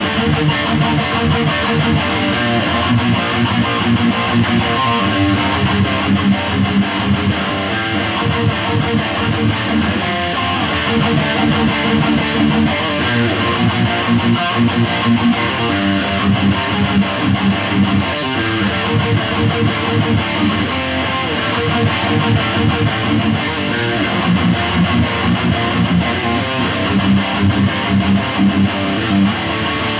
METAL

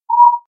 Robot Blip

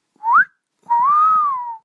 flirt whistle

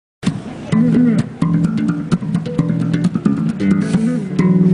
Bass Guitar

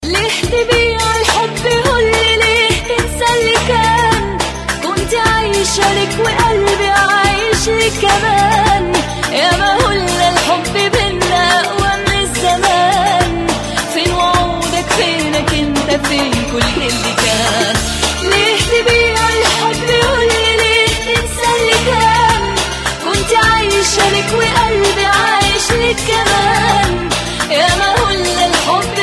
Arabic Old

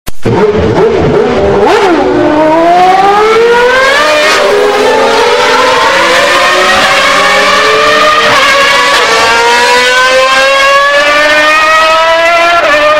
Formula 1 Ringtone

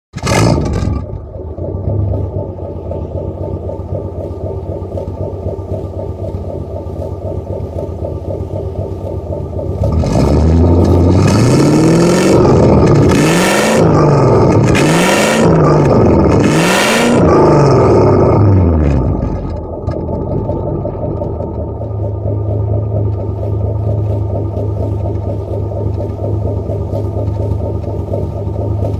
Chevy impala stw 63

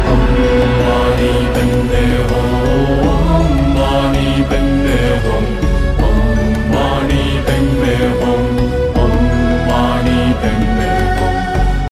Om Mani Padme Hum

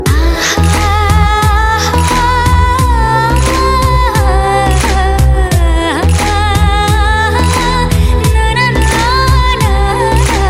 Humming

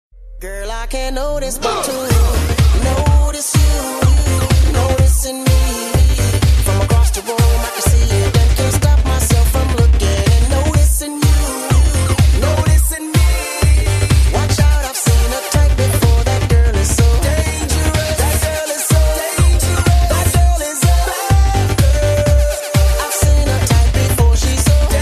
Morning Alart

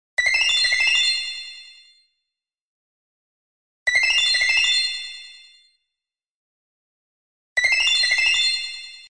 ring modern

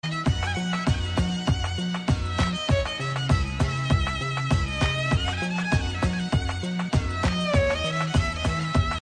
Nice Violin

